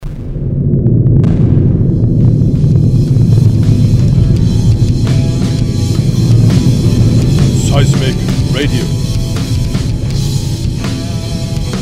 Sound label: jingle